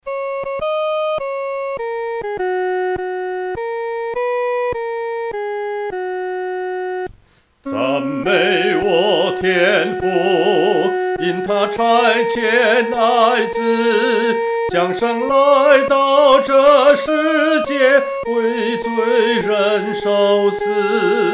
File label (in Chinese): 独唱（第一声部）; 赞美我天父